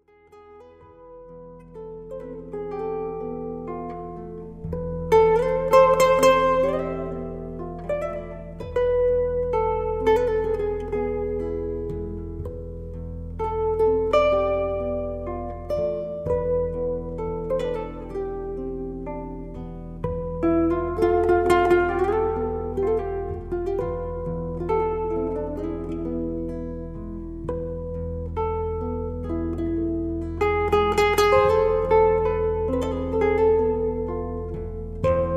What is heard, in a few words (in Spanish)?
Nana Berceuse, gitarre; De Falla